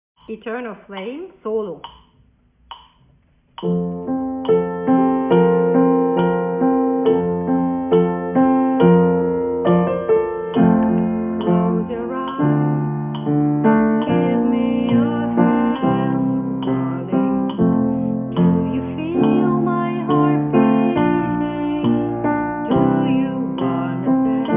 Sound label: Solo